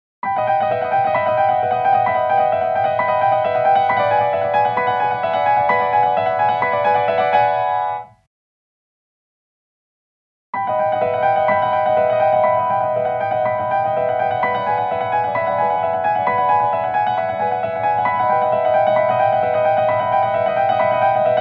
Lexicon PCM70 Rich Chamber Piano

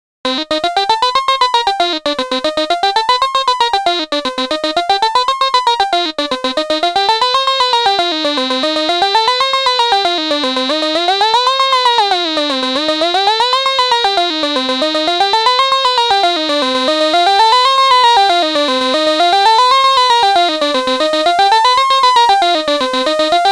PAiA VCO

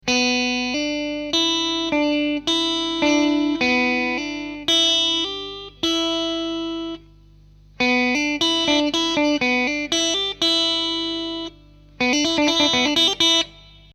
Lick

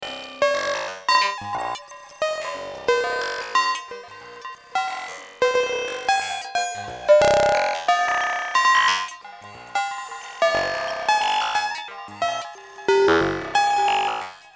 Roland TR 8S Pattern

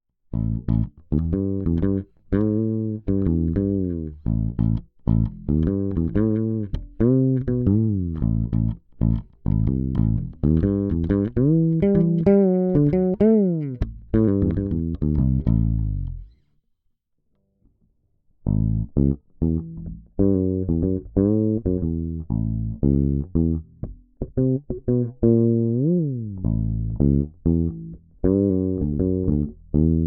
Fretless no preamp both pickups

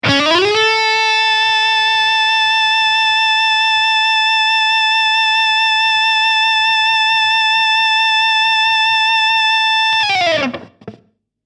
Schecter Sustainiac

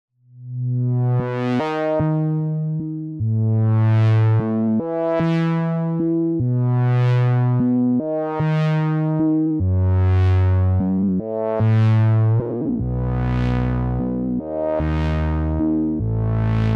ms20m ws crazy pad

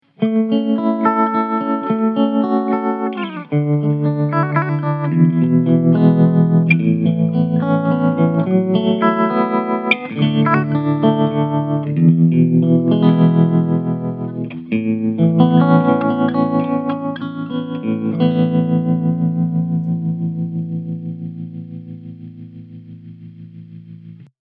tremolo

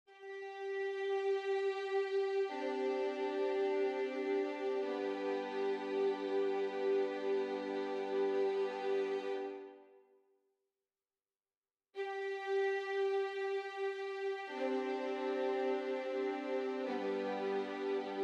Violins 2 Violas Divisi Split

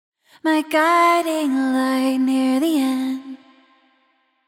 Vocals Rev Vocal Smear

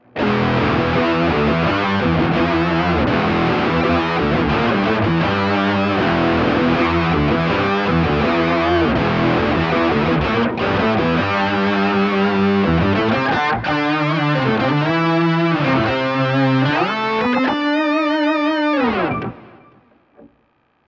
Pitch Shifter Mode Octave Down

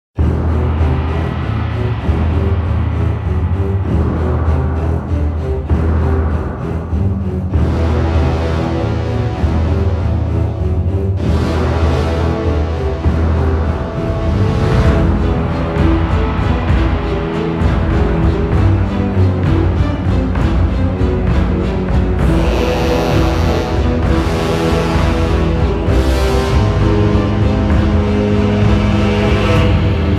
Fantasy Strings Low Strings

